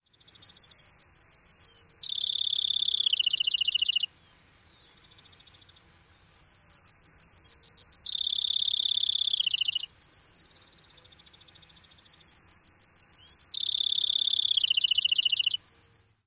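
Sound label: songs, Brewer's Sparrow; CA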